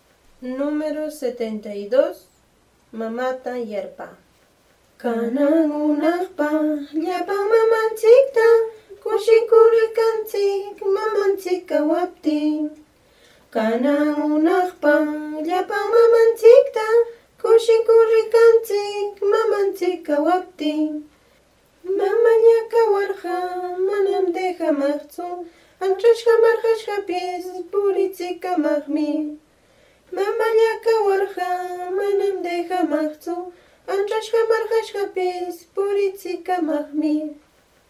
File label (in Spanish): cancion